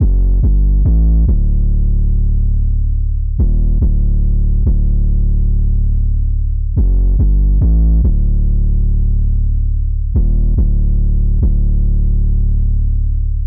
808, 142 BPM, Gm; Unison Free 808 Samples

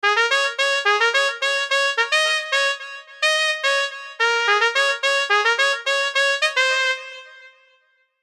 Brass, Deep House One-Shot; Unison Deep House One-Shot Collection

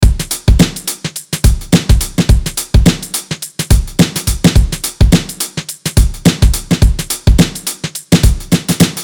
Acoustic Drum, 106 BPM; Unison Acoustic Drum Pack

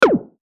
Perc; Clown

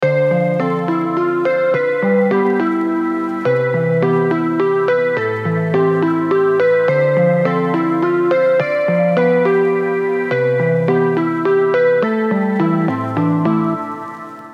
Splashy C 70; Lo-Fi MIDI Melody; Unison Lo-Fi MIDI Melody Collection

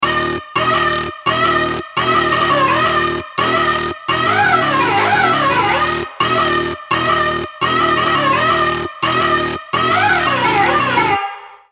Trap MIDI Melody, G#m 170; Quarter; Unison Trap MIDI Melody Collection

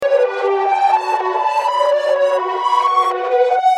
music loop, 126, B; Unison Artist Series Essentials

